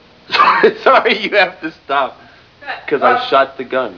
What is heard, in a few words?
shotgun